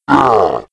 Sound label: Chef Attack; Playable Tutorial Level